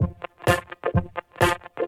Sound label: Vocoder Loops 128BPM